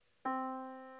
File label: Yamaha Disk, piano sound